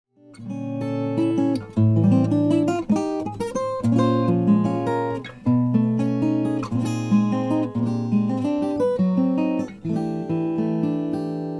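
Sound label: 00 Madagascar rosewood acoustic guitar; julius borges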